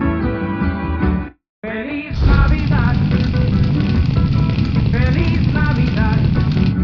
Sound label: fire; felis